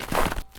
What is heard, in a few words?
snow fall; Golden Crayon Sounds